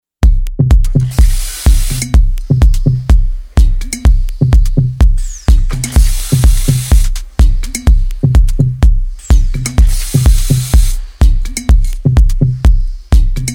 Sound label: Radio Noise